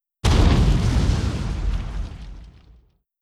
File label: airplane bomb; Hearts of Iron IV Death or Dishonor